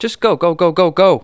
bumping; Messiah

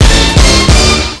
Extra Ship; Apeiron 1.0.1; Ambrosia SW

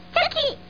twin noise

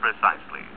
precisely, chime

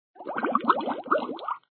bathtub; my new room

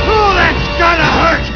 hurt; The Complete Doom Accessory Pack Volume I

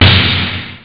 snare; OctaMED SoundStudio V1

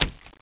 splut; alienbreed3d2

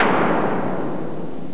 cave sound; Epic Collection 3, The; Epic Marketing; muzak

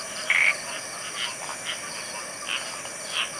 frogs; The Sum of All Fears

